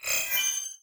Feature Unlock Popup Open

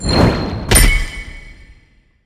coin flip; netent

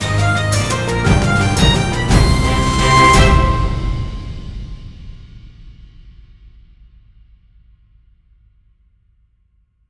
free Spin End; netent

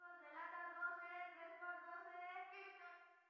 amb People; netent

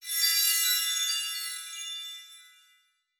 lazer shimmer; netent

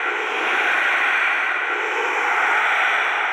crowd loop Away; netent

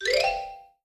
Button Spin; secret of the stones; netent

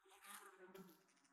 insect; netent

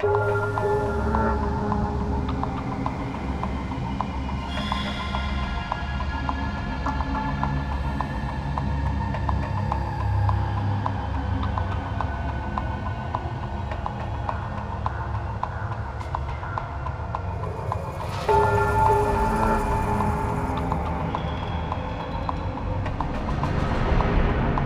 free spin intro loop basic draft 105bpm

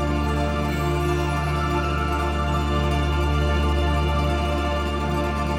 wheel Spin Mel; netent

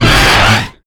Grunt Hit Heavy; netent

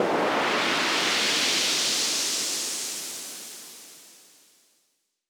linked Reels; netent

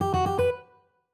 double Up Bell; netent

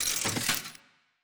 reel nudge; netent

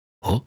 red Panda Looks Up; netent

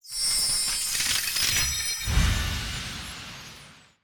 key Presented FS; netent

